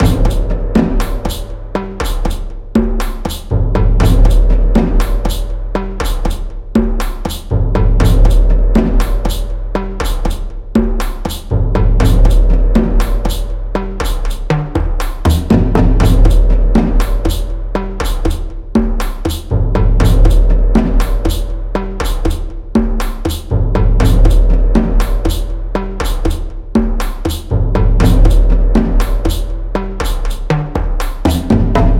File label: 60BPM, Percussion Kits; Ghosthack; SkyRealm; Warriors Code